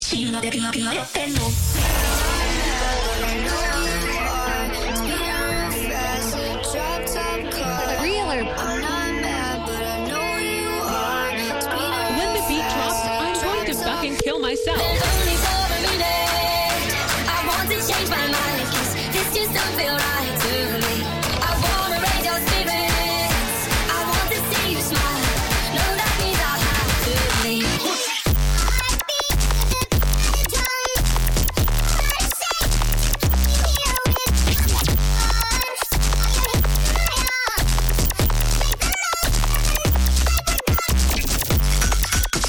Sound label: brazilian funk; how not to make